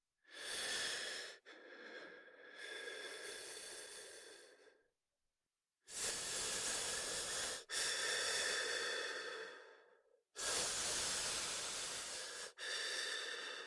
human breath male deep opened mouth breath with s formed lips long exhale; fvtt sonniss gdc audio